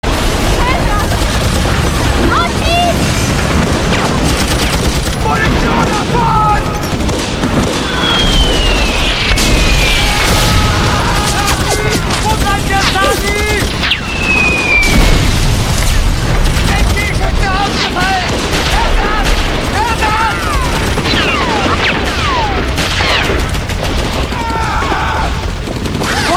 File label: Berlin street